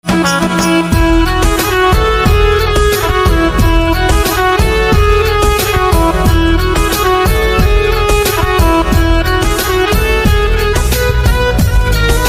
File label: Saxophone